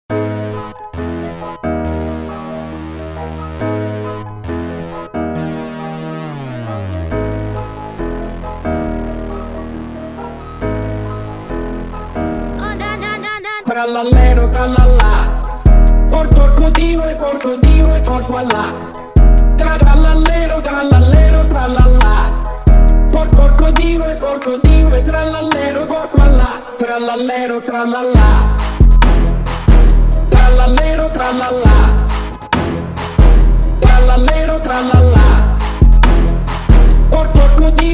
sped Up Trap

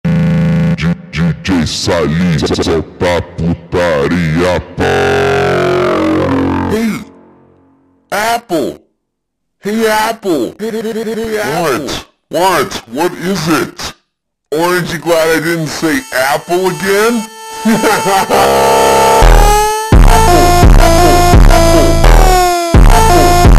Funk ultra Slowed

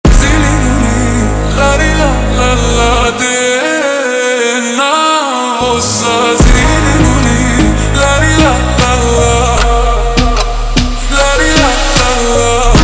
Funk slowed

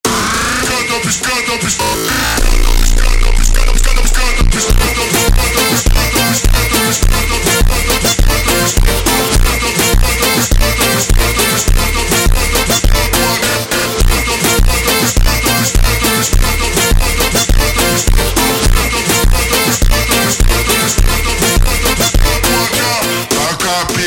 Montagem Ultra Slowed

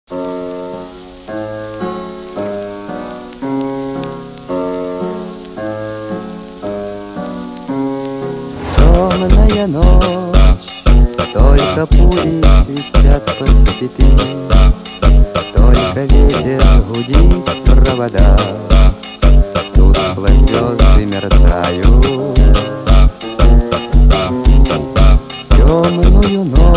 Dark Night Funk sped Up

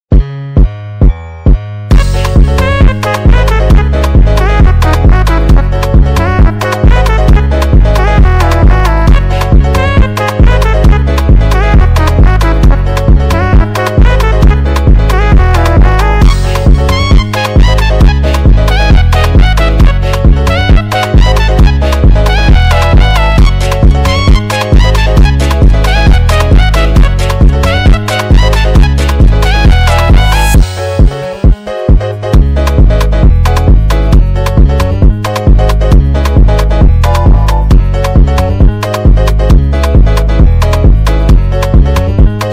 Type Beat, bassboost

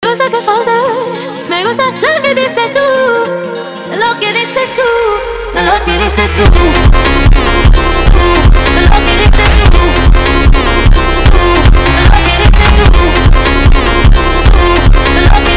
Phonk speedup

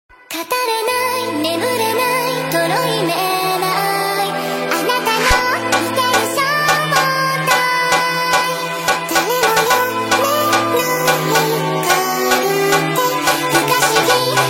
FUNK Slowed

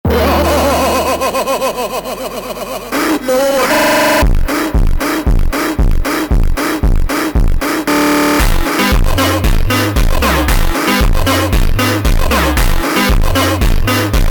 Funk Rush Laugh Slowed

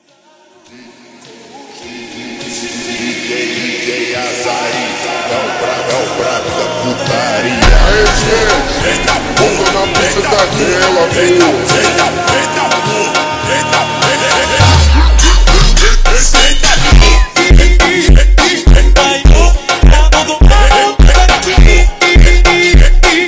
FUNK SUPER SLOWED

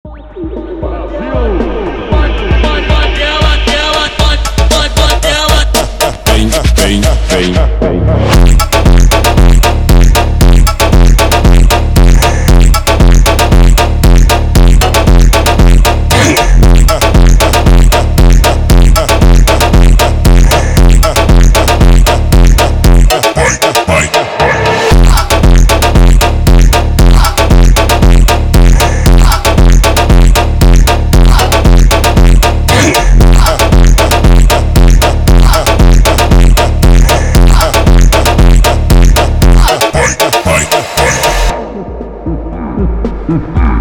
slowed Reverb, PHONK

